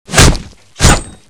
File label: stab